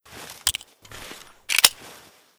reload, weapons; fort

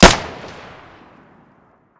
pm muzzle stereo, weapons

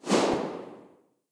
m4a1 silencer mono very far, weapons

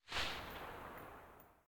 scar silencer mono very far

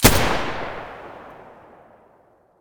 muzzle g36 stereo, weapons

